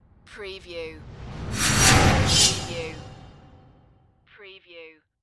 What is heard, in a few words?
Whoosh; Sound Effects Bible